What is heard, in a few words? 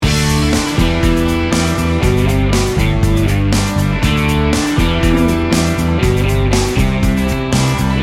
americana rock loop